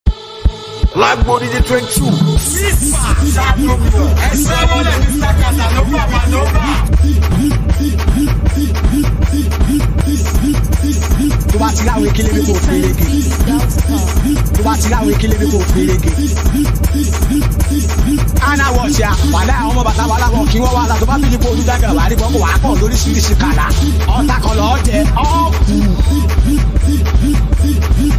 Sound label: Beat